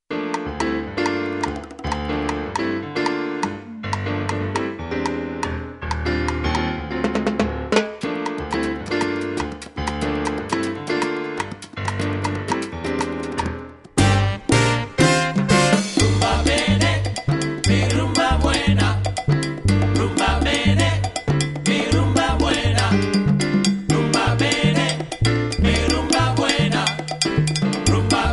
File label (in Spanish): RUMBA